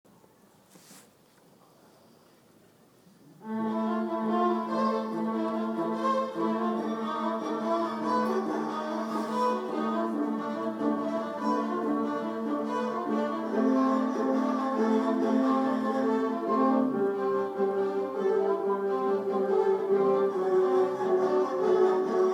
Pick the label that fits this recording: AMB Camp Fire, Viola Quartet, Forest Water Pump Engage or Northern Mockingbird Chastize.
Viola Quartet